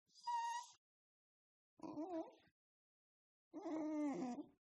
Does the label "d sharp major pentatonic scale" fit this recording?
No